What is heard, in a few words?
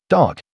dog; on tap ve chu de dong vat; tu vung ve vat nuoi